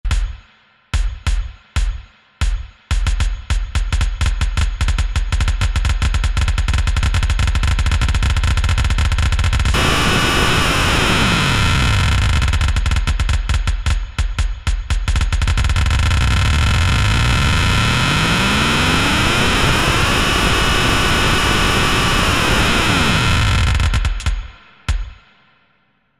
Tip Top Audio Circadian Rhythms Audio Rate Ext Clock